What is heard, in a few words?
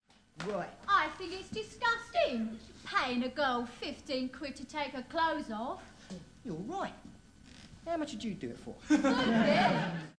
disgust